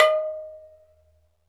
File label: PERC, Dance Mega Drums; Best Service; PERC MIX